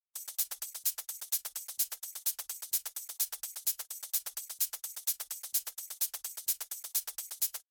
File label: Hi Hat Loop